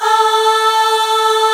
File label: POP AHHS